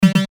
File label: CLICK DOUBLE ROBOTIC; Clicks; Blastwave